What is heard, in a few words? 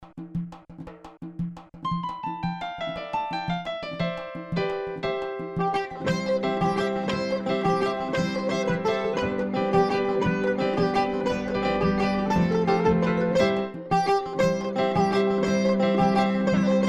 music ( tar piano )